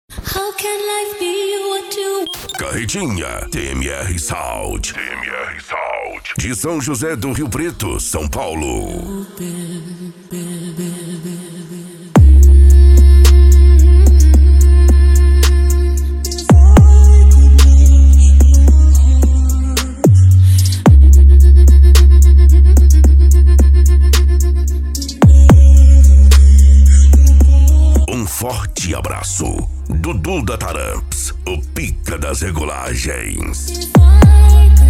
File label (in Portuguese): esp insane sound